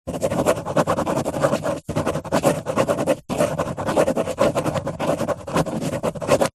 office pen ballpoint paper hollow table write scribble; zapsplat